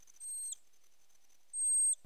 sinicus, bats; China bats

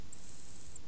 greater horseshoe, bats echo; britishbats

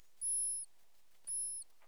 pusillus, bats; China bats

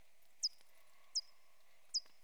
abramus, bats; China bats

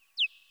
serotine, bats, echo; britishbats

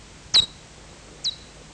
nathusius echo, bats; britishbats

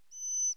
pearsoni, bats; China bats

